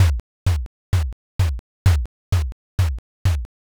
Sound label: bon taiko